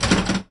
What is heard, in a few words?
Pinball Bumper